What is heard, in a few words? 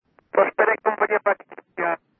qso sub receiver